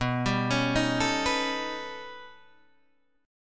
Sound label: B Augmented 9th B down Guitar Open D